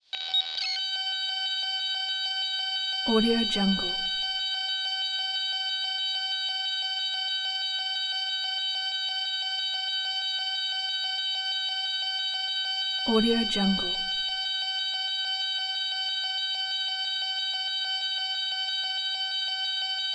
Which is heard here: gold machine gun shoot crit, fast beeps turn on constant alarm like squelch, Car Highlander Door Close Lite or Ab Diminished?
fast beeps turn on constant alarm like squelch